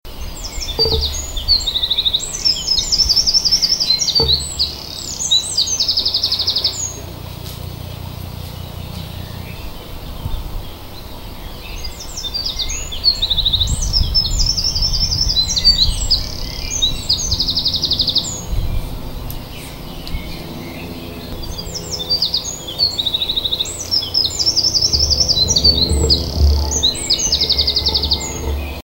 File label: troglodytes troglodytes, vogel; hecke; biologie